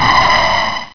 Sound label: WHOOSH